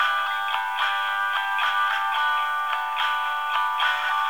ringtone; gta iv

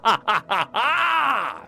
hahahahhaaaaaaa

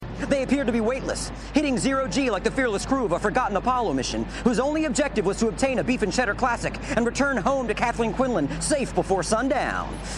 hit by car

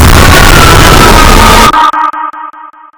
jumpscare sound; project midway pup

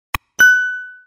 swing ding

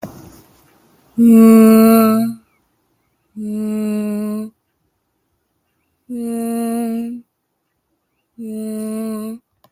confront suspense

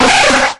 nidoran female

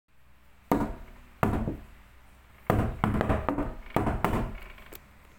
knock

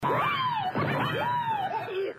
noid tv laugh

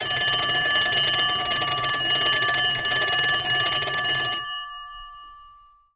cartoon telephone; daniel simion

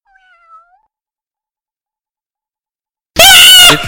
kitty meow

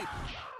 ninja sound effect

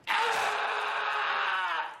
pizza scream

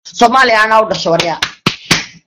somali chant

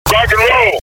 rock n roll low

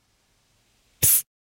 screech psst; troll soundboard button

